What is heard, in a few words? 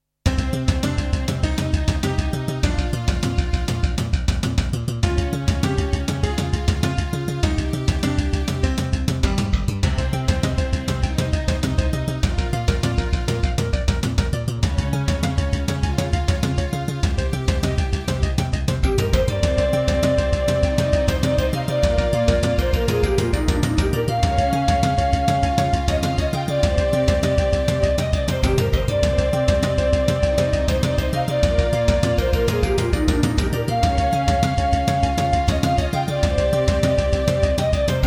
Introduction, Yamaha TG100; Hocus Pocus